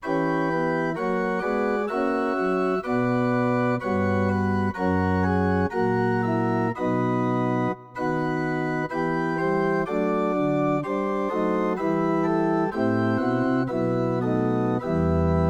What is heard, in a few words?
church organ, Yamaha NP-30; Demo Songs